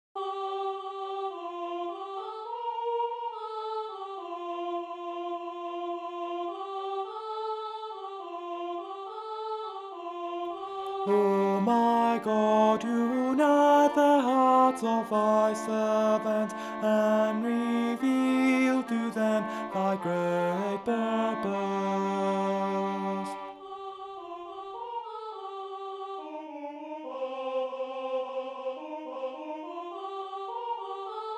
tenor

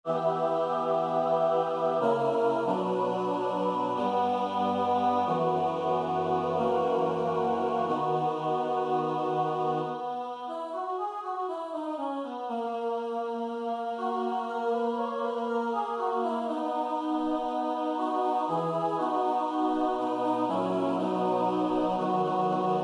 tutti, Choral Music; candle of thine heart

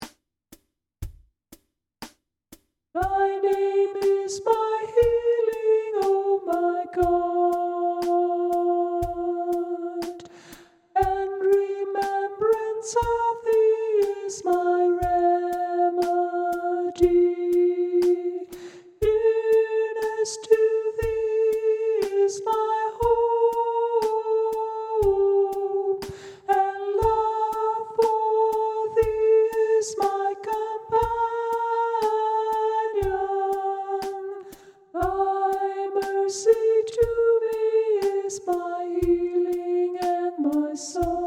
soprano, choral music

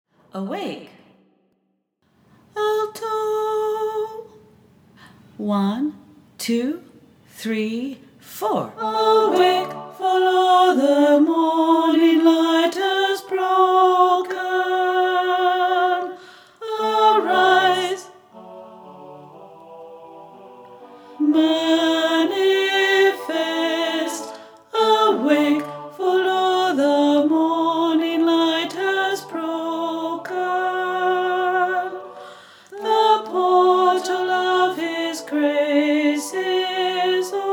alto

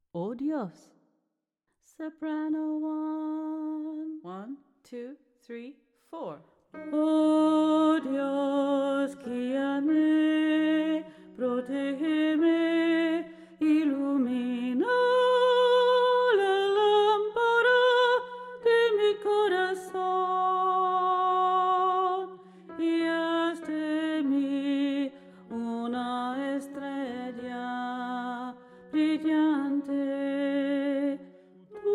soprano, choral music

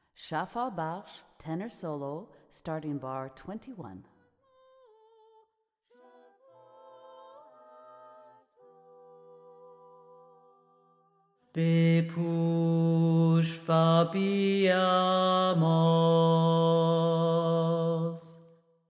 solo, choral music

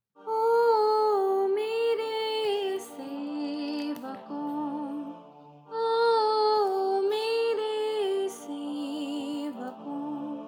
soprano native hindi singer, choral music

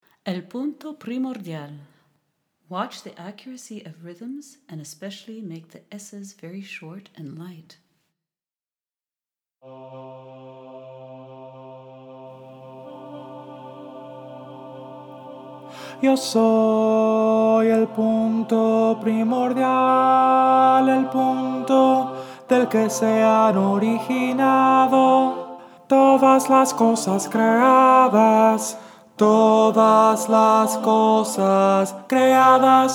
tenor

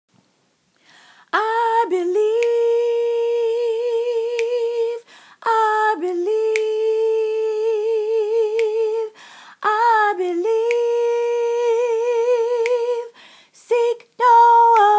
soprano; be thou content

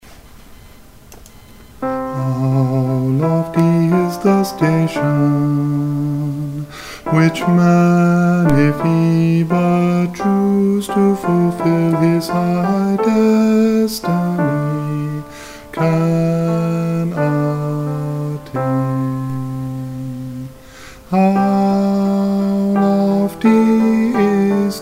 alto voice; how lofty is the station

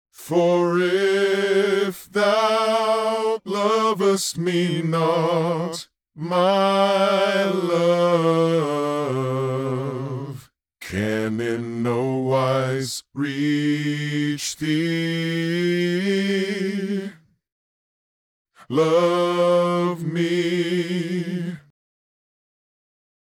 love me, bass, Baha'i Choral Music

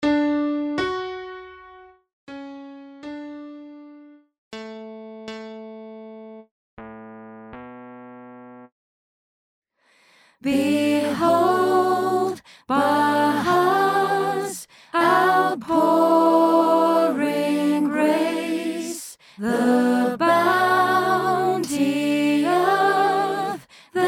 soprano

